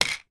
household vitamin tablet container put down on floor